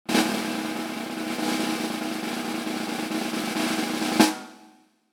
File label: Rimshot 4 second roll